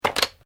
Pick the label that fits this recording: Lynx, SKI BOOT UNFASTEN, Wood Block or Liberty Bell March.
SKI BOOT UNFASTEN